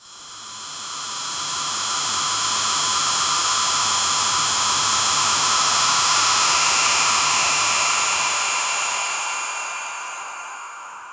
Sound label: futuristic steampunk, clanking